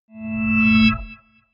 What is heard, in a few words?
chord swell short